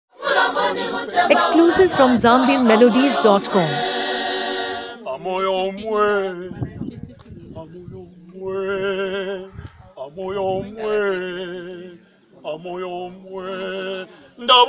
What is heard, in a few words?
New Apostolic Church Choir